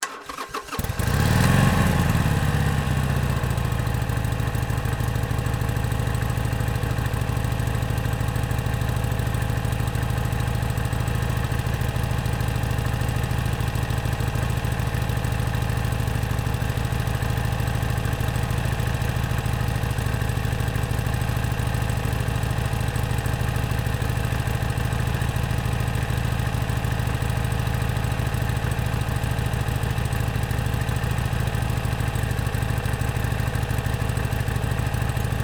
Harley Davidson Sportster Iron 883, Start, long idle, shut down, Beside vehicle; Borg Sound